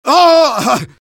engineer pain severe; svencoop addon; tfc; player

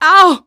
alyx hurt; svencoop addon; playersounds

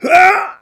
launch big pain; eli; svencoop addon; playersounds

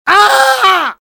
spy pain severe; svencoop addon; tfc; player

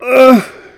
launch pain; eli; svencoop addon; playersounds

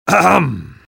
battlecry; tfc; svencoop; spy

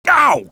ow; rebel; svencoop addon; playersounds

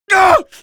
rebel pain; svencoop addon; playersounds

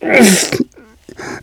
launch pain; eli; svencoop addon; playersounds

